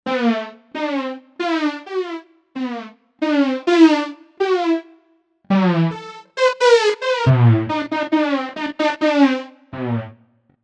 String Shot, Novation UltraNova; Factory Patches

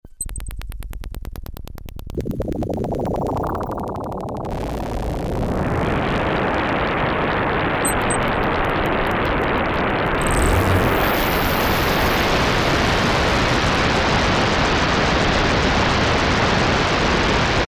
ST.HELENS, Yamaha DX7

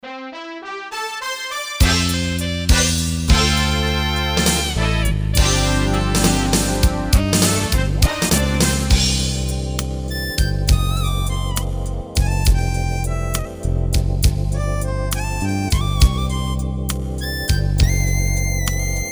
Magic Harp, Organ; Korg MSC-9S Organ; Factory Demo Songs

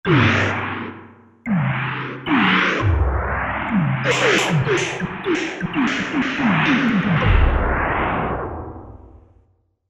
Dust Shoot; Korg MSC-14S Sound Effects

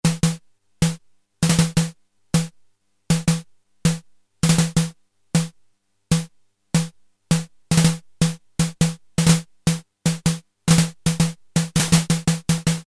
Snare, Prophet-5; Sequential Circuits Prophet-5 (Ver.3); Factory Patch; File 3 Programs

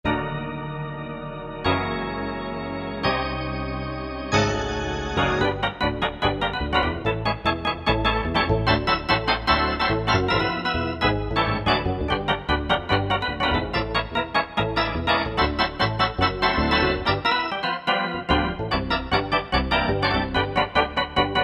E. Organ, Roland U-220; Factory Patches

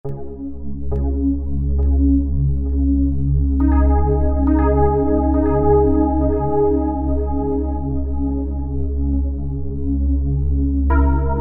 SYNTH PADS; Swirling Droplets; Apple GarageBand 2

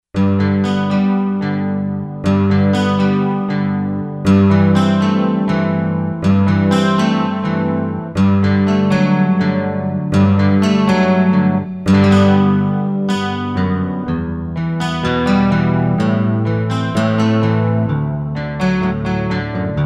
Old 12 String

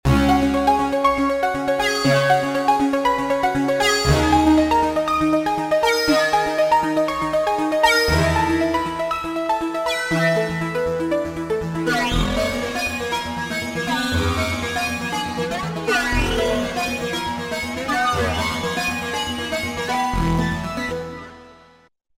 Overlord, Roland V-Synth

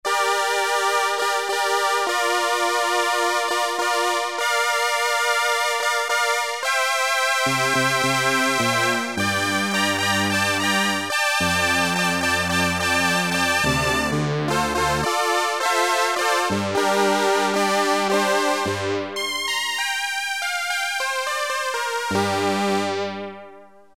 SYNTH. STRING, Casio CZ-1000

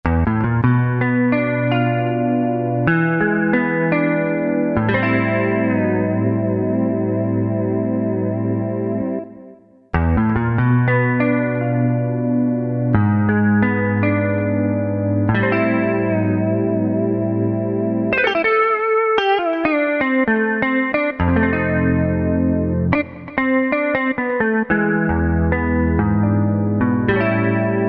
MELLOW & CLEAN, Roland SC-880; Factory Patches

